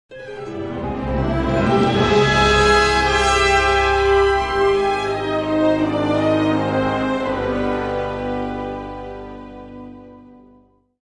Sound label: ambience positive; Knights of the Old Republic II-The Sith Lords